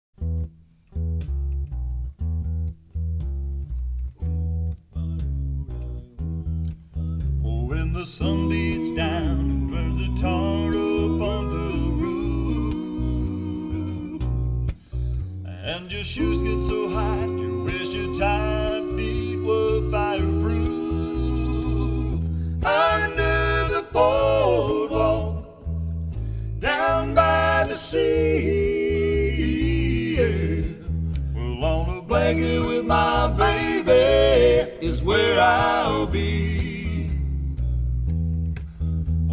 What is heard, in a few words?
Vocal sample, bluegrass band